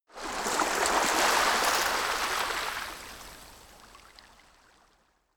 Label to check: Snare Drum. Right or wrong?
Wrong